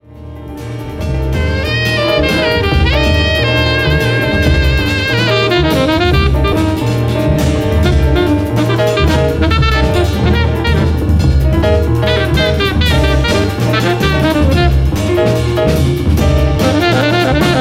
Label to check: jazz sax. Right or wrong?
Right